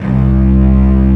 String Sus, c2; BGM; Nintendo; July 2020 Gigaleak

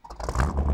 warthog, in; warthog hotrod; vehicles

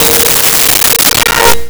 Celeste Bb4; Nintendo; July 2020 Gigaleak

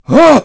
slide

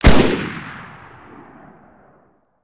battle rifle, fire; weapons

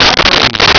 harp ring; Nintendo; July 2020 Gigaleak

